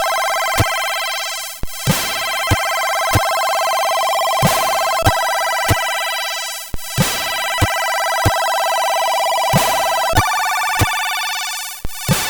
Oxygone Symphony, CSG8580; CSG8580R5

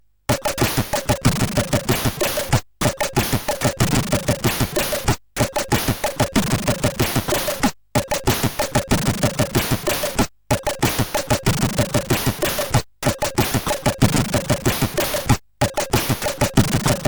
Funk, CSG8580R5

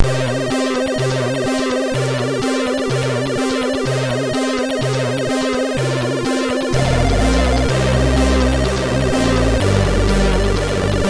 Music sid MOS6581R3

